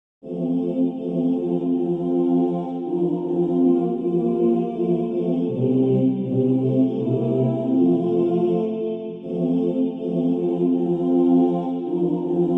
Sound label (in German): erlaube mir sopran